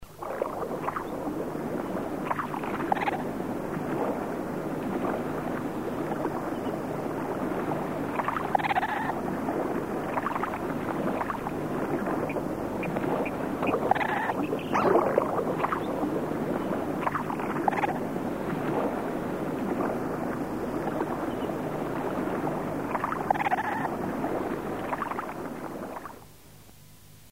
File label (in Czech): ondatra